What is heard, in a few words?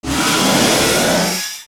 Scream; Ganon's ferocious roars in the final battle of Ocarina of Time were reused from Queen Gohma and Volvagia's own roars, though slightly edited